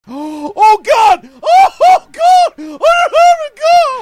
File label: bams yell